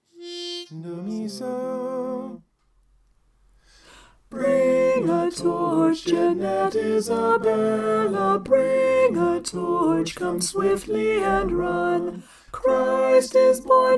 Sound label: bring a torch jeannette isabella soprano